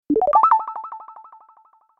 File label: Bright message alert notification tone